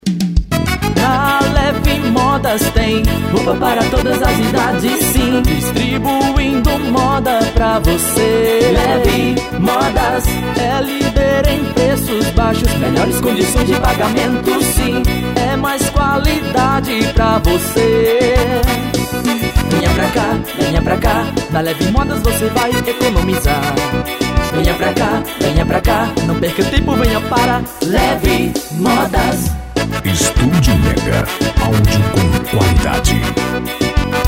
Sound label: JINGLE; LEVE MODAS